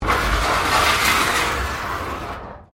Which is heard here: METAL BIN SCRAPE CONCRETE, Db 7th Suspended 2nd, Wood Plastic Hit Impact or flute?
METAL BIN SCRAPE CONCRETE